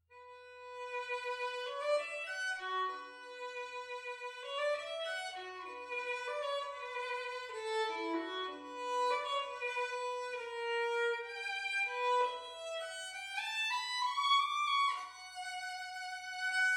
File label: Compass Violin